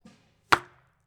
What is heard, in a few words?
arrow into target